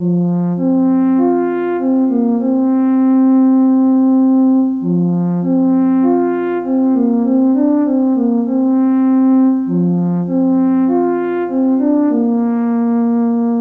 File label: fanfare